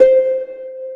Harp, c5; BGM; Nintendo; July 2020 Gigaleak

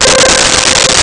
Bottle Blow C5; Nintendo; July 2020 Gigaleak

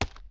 walk, footsteps hard surface; grunt footsteps

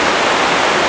wave; Nintendo; July 2020 Gigaleak

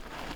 dirt jump, footsteps; chief

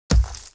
heavy dirt, dirt hits

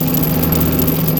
tank; Player SE; Nintendo; July 2020 Gigaleak

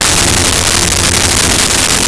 Cello F2; Nintendo; July 2020 Gigaleak

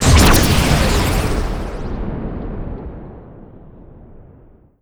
fire; c gun tower

